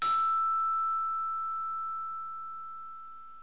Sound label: chime; Multimedia Cube; bells